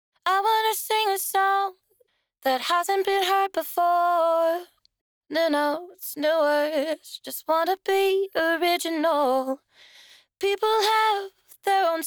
Acapella - Main Vocal DRY -100bpm B Minor; Vocals; Black Friday Free Acapella Pack

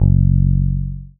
Bass Guitar F#; Basses; Cymatics; Eternity Sample Pack

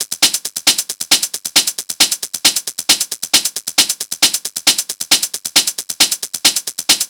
Hat Loop 135, Techno

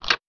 Percussion; Cymatics; Eternity Sample Pack; Drum One Shots

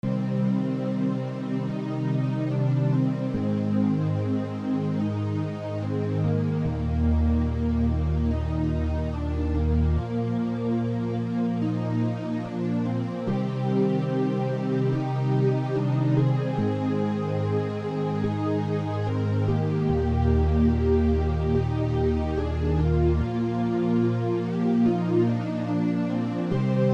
Test Orchestral Mix; by ETM

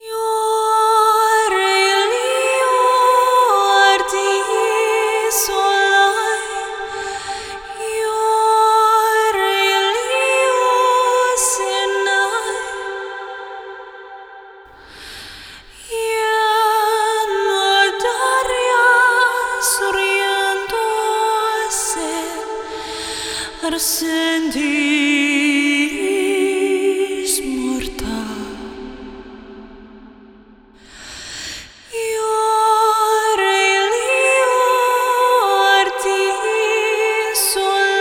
Top Line Vocals (WET); Construction Kits; Am 60Bpm; Ghosthack; SkyRealm; Kit 03 - Winterhold

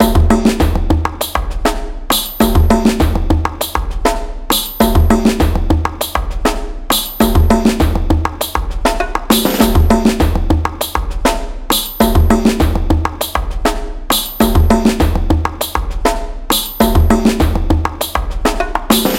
100BPM, Percussion; Percussion Kits; Ghosthack; SkyRealm; The Huntress

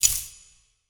UI Ice Select; User Interface

